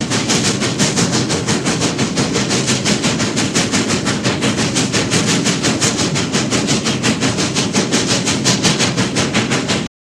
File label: Steam Loco Shrt Co